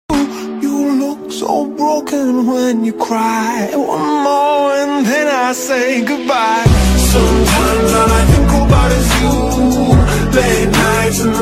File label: slowed reverb ringtone